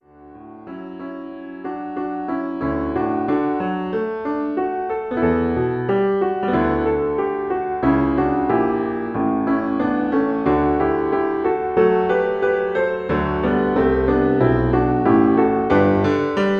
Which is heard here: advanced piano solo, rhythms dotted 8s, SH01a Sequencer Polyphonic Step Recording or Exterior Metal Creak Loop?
advanced piano solo